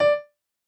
chorus D6

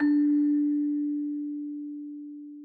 Celesta Low D

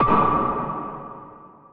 Drop (D5)

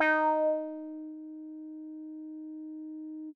D#5 meow

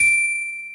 Bell D7

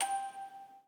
musicbox

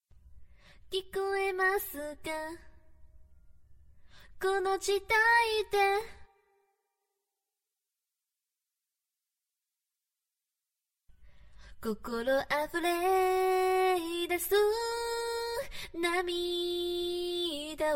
Choir

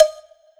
BELL; osu

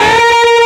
bend full Left-hand vibrato; osu

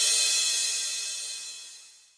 Ride Finish

